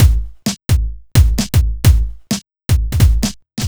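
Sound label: bass drum snare, Drum Loops 130bpm; Zero-G; Soundclash Nu Dance Electro; Acidized Wavs; Dub Me Live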